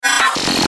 Glitch Buzz Beeps; Production Element Imaging Element Accent Transition

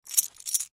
Coin In Hand, Foley; Pirate Gold Doubloon; Money; Objects; Blastwave

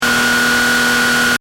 Engine: High Revs Loop, car; Cars; Vehicles; Stuart Duffield

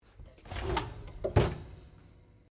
OVEN FANFORCE DOOR OPEN; Common oven sounds; Appliances; Household

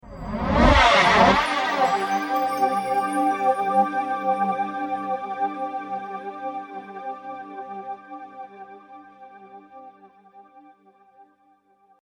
Cinematic Hit Flanger Sparkle

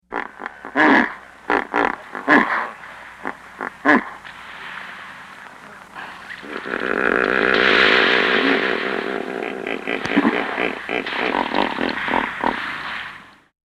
Hippo Grunts Vintage; Miscellaneous Animal

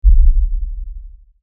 LFE Tremor, Lfe Tremor Low Bass Shake Rumble

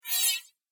Robotic Slider Sound, sci-fi robot sound effect; Robot Sound Effects; Andrew Fleming

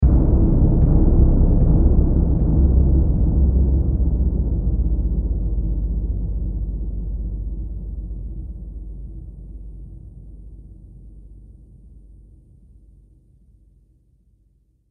Drum Hit Trailer; sound design

